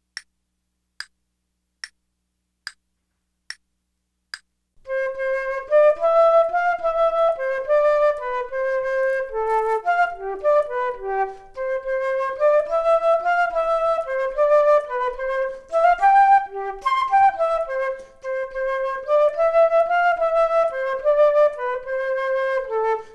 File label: Duets flute; Selected Duets for Flute Podcast